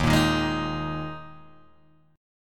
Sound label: Eb Suspended 4th Eb Guitar Open G